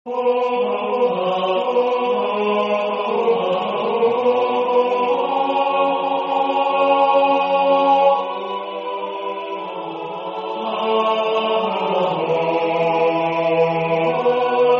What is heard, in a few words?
Tenor; H.Schutz